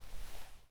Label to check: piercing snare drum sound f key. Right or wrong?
Wrong